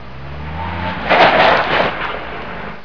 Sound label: trucks